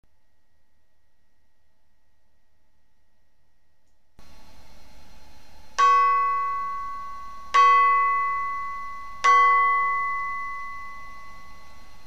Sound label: peace signal